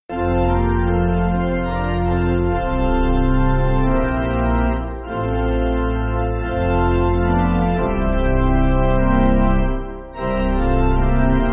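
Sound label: Pipe Organ, church backing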